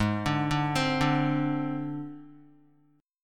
G sharp Major Flat 5th, Guitar

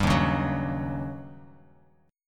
D sharp 7th Sharp 9th D sharp Bass Standard; strummed; JGuitar

